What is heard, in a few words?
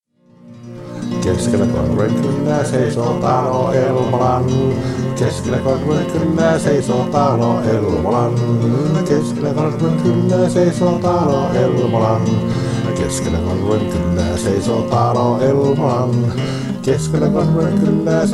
ACOUSTIC; Elmolan talo